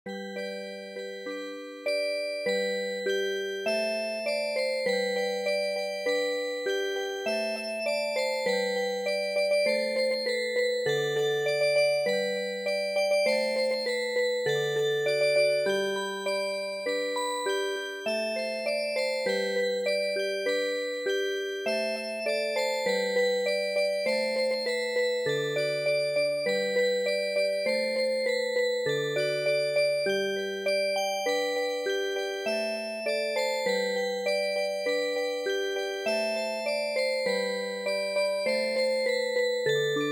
Music Box

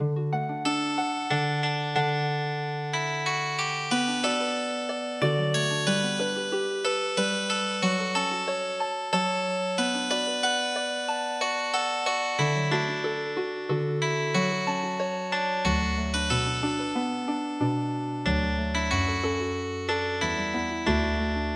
Healing Harp, traditional

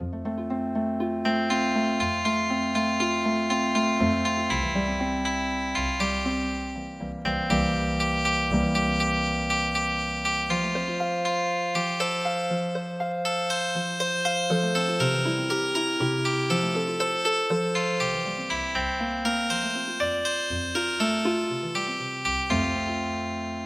round the world tour Healing Harp

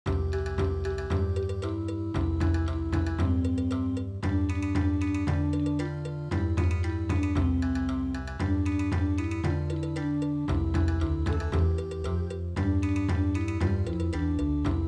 Bon Odori

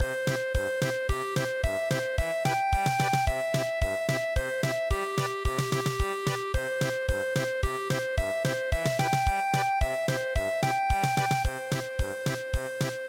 polka

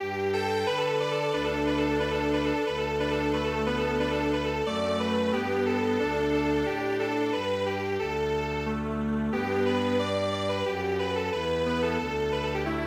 festival dance Strings Quartet, traditional; midi

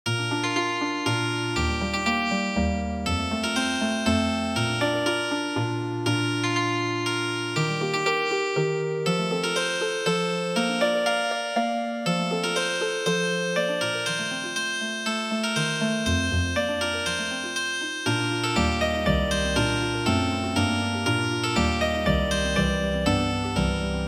Healing Harp

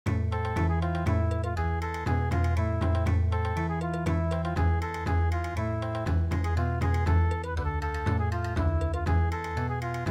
Bon Odori

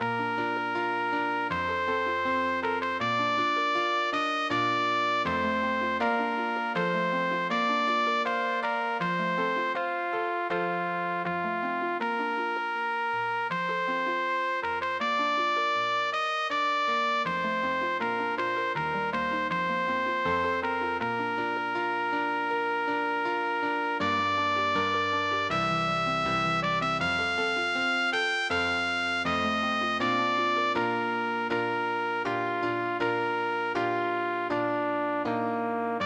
Trumpet Voluntary Healing Harp

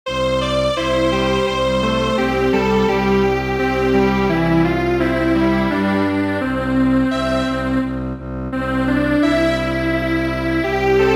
Strings Quartet

